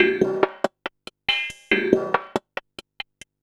perc 140, Percussive Loops 140 bpm

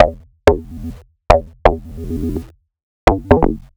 Ghetto Tech, Synths; Sonic Foundry (Sony Creative Software); Underground UK House & Electro

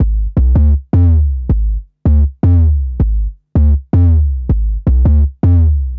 Boom Bass, Drum & Bass; Fluid Dynamics

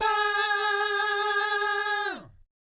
VIB FX, Vocal Planet Jazz & FX; ILIO; JAZZCOMBO

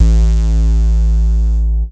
dance, bass

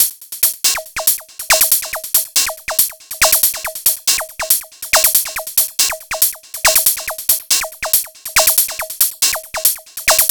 Percussive

